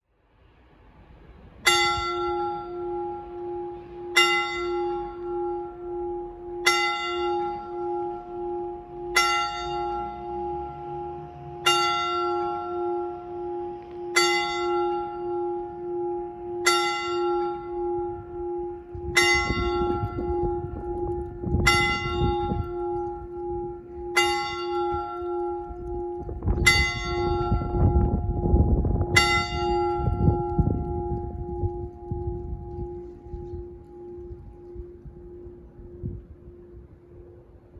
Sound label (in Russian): kolokol 12 chasov